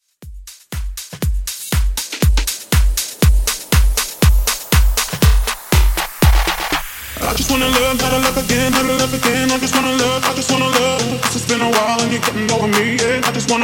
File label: Club Extended Mix 120 Bpm